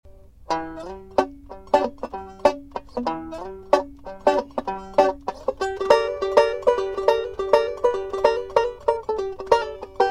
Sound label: banjo